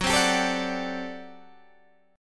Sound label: G Major 7th Suspended 2nd Suspended 4th Gb